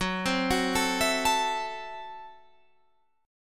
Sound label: F sharp Minor 7th Flat 5th F sharp Guitar Standard; 14,15,16,14,17,17; arpeggiated; capo=3